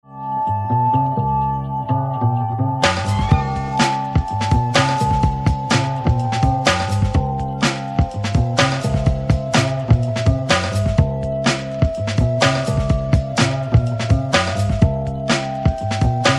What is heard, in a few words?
Classical Instrumental